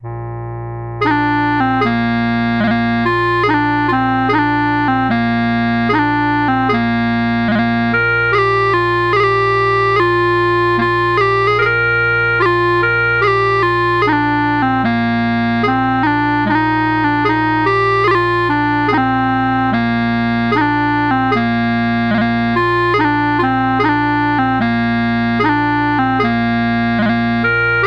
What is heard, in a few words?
Campsie, Horsbrugh, pipes, 50 bpm; Campsie Hills / Horsbrough / Jim Thompson